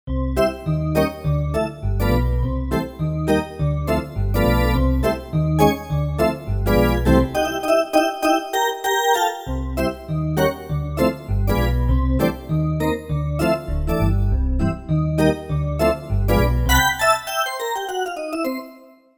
E.Organ; Factory Patches

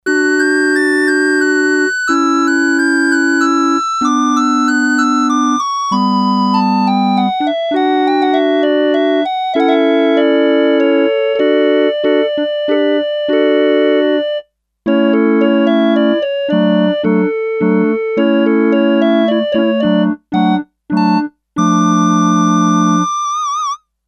High Organ Flutes, Sequential Circuits Six-Trak; Factory Programs

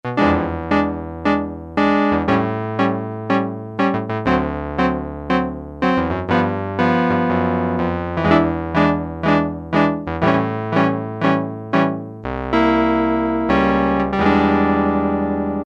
Nylon Guitar, Roland Juno-106; Factory Preset

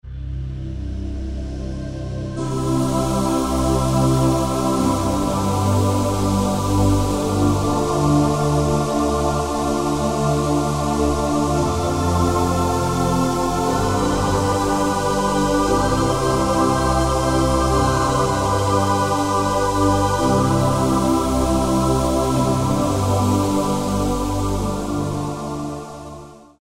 Yamaha TG500 CH ooh ah

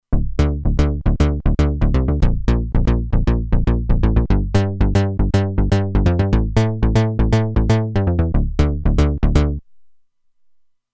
Noise Bass, Roland Alpha Juno-2; Factory 2

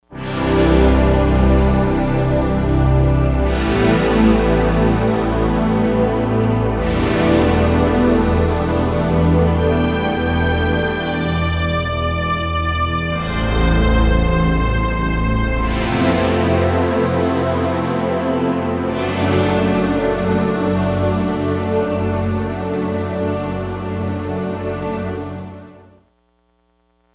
Slow Pluck; Digital Synthesizer

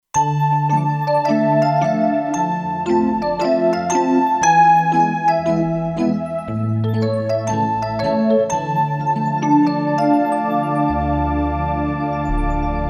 Kurzweil K2500X; Factory Setups

